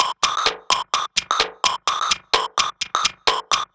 perc 128, Electro percussion loops; Anthemic

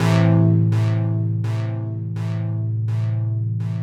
DEEPHOUSE ORGAN 125 A

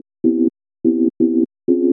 house synth 125 A, keys

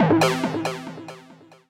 TECHNO EXTRA 140

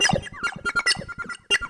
PERC LOOP INDST 140, Industrial

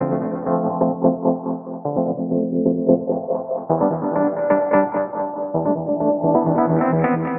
130 Fmaj7 Wurli Vibes, Keys; Loops; Touch Loops; BlissedOutBeats

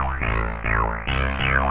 TEKNO 140, Techno; Techno2; Sphere

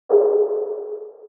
HOUSE FX 125

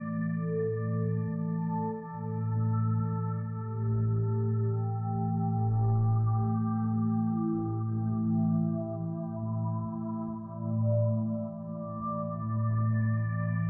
SPACE PAD 140 A; SpaceSounds

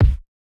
Kicks; Touch Loops; CosmicFunk

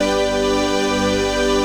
PAD

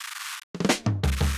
Fill

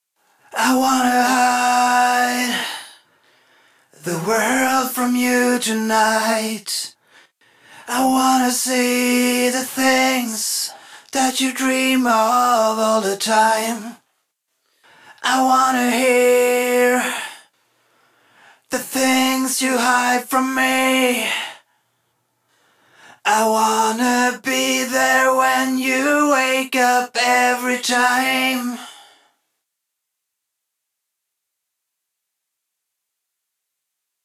Main Vocals

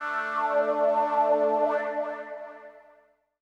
SPACE SYNTH 140 A; SpaceSounds

